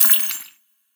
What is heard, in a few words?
win; DoubleTigersWD; wazdan